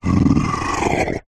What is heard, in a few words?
horror yeti monster sigh breathy tired; zapsplat